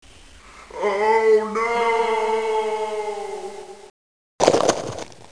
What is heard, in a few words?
FALL